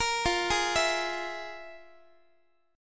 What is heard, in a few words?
F Minor Major 7th Double Flat 5th, Guitar; FmM7bb5; arpeggiated; x,x,13,15,12,15